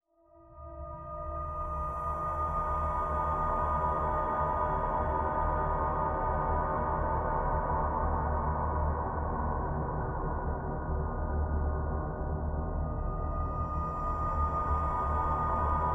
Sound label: Atmos Dark Monkey, Synth; Loops; Tonal; Ableton Live 12 Lite; Core Library